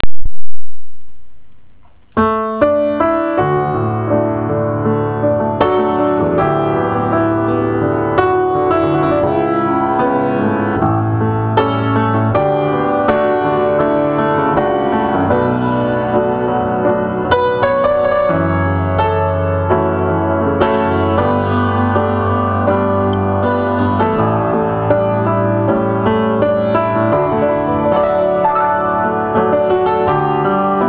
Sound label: Piano Composition